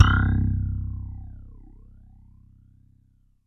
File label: JV phase, JV-1080; Syntec; Wall of Sounds